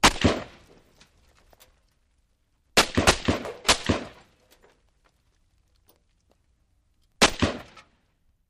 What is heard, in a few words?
M-16: Single Shots With Impacts And Debris